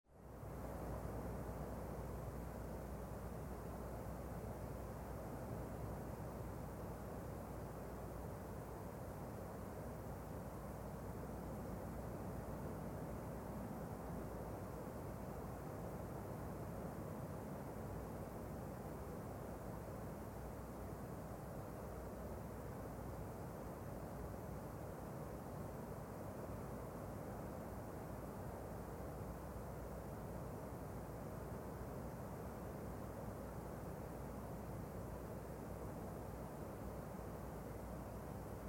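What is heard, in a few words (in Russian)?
в лесу или парке; Звук легкого ветра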